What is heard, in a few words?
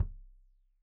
Kik Stereo; Deeply Sampled Drums